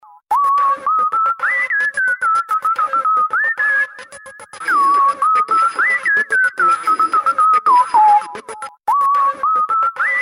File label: Whistling